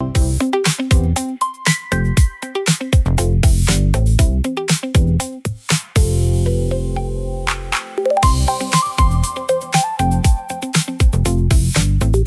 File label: loop; bgm